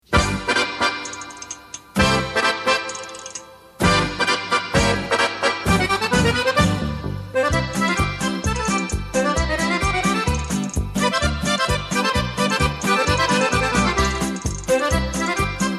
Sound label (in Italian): Polka, Fisa